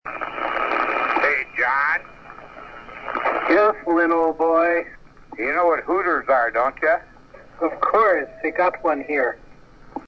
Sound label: hoot ers